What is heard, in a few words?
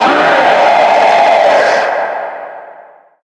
Meowser Roar; 3D World